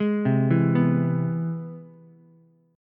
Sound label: C Augmented Major 7th C down Ukulele; arpeggiated; chord; JGuitar